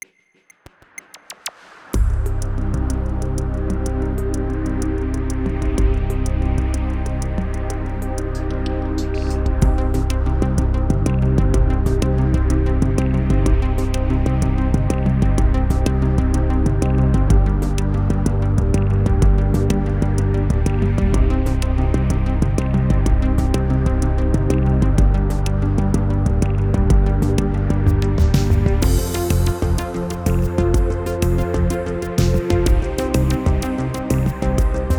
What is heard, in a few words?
style dance 6-8 trance, yamaha tyros3